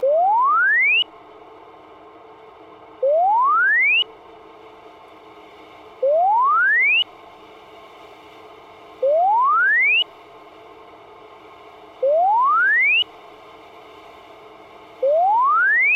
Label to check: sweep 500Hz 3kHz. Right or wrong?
Right